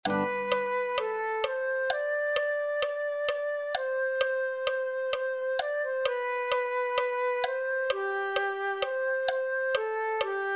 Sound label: flute